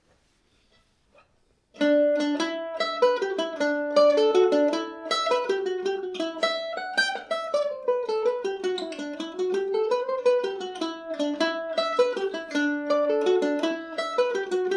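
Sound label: E minor, Irish mandolin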